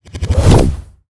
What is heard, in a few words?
monster spawn